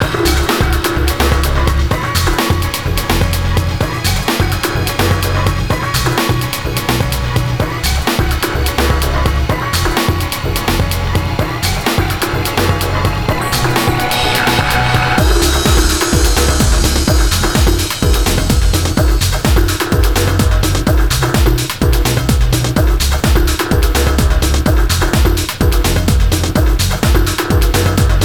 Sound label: flames music